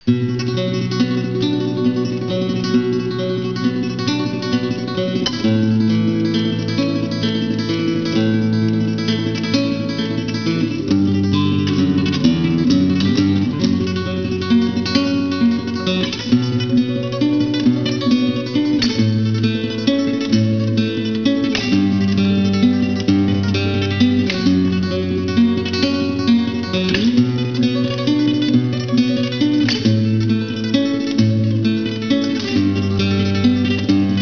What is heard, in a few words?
basque guitar